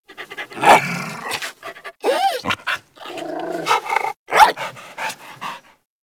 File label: Puppy Claim Confirm; Vocals; Warframe; Kubrow